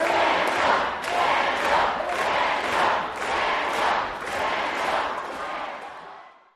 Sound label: Crowd Chants "Daniel"